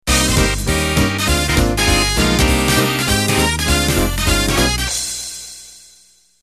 Quickstep intro

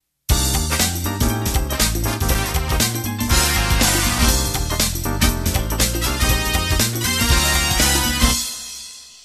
Groove Funk End

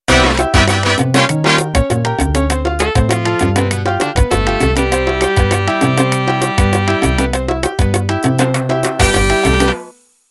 Salsa Intro

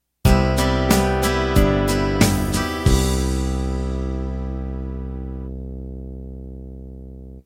Country Ballad End

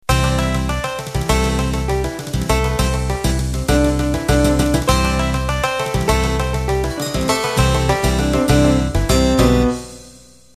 Cajun Country Intro

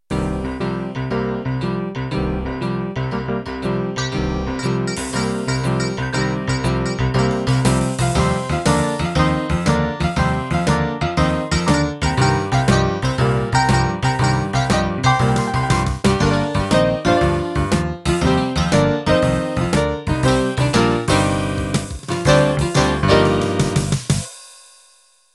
Rockabilly Intro

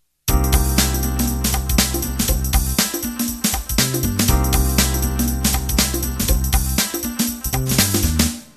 Groove Funk Intro

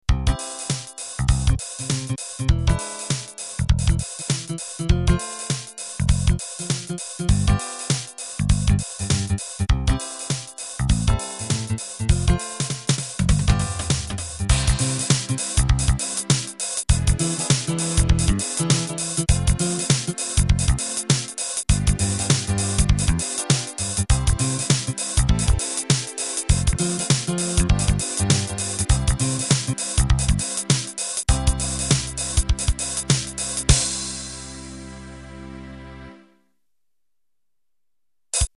Swing Rock; Picked Bass, Electric Piano2, Nylon Guitar and Strings

